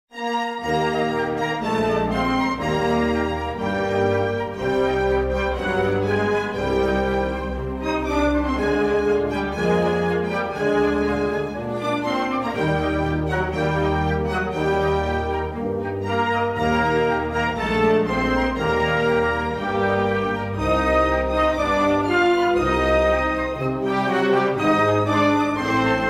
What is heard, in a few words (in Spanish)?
Himno de Islas Vírgenes de los Estados Unidos